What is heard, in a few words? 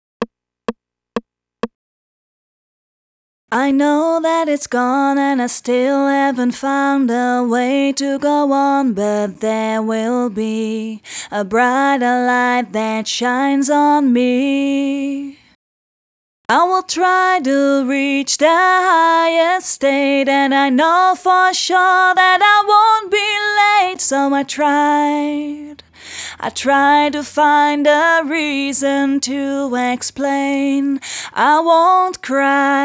I Won't Cry, Vocals; Mutekki Media; Ultimate Vocals VOL-1